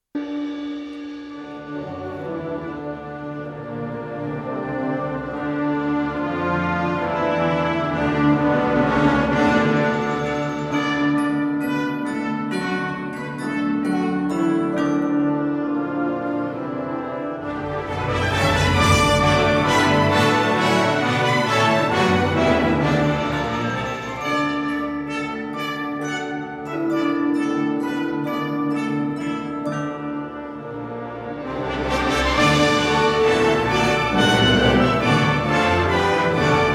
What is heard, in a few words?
toccata; eighth symphony